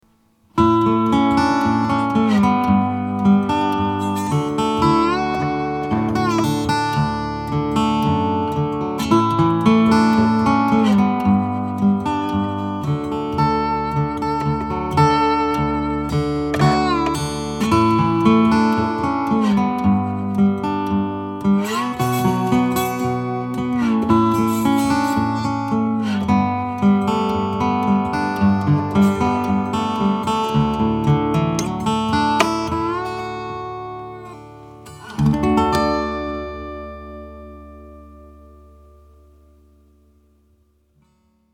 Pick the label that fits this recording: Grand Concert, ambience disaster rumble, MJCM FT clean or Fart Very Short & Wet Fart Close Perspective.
Grand Concert